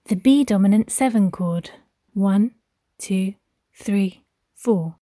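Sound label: C dominant 7th chord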